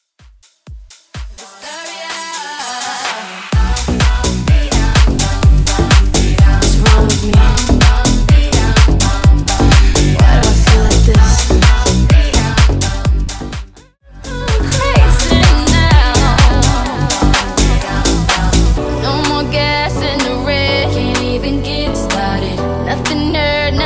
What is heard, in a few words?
Remix 126BPM; Botleg; remixplanet